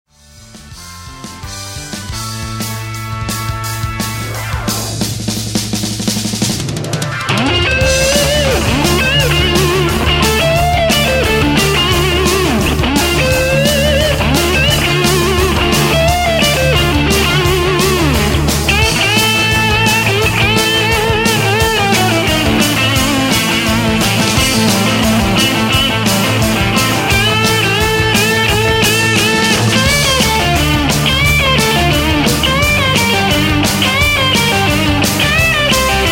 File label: solo